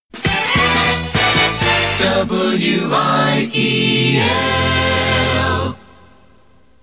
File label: jingle